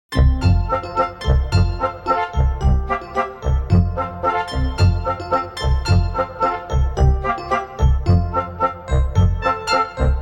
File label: Instrumental; Dans la foret lointaine